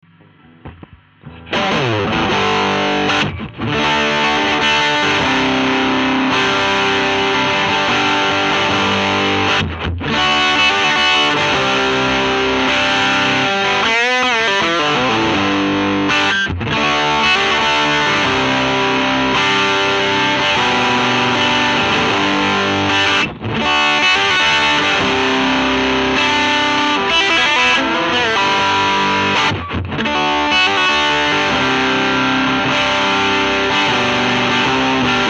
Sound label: strat disto mxr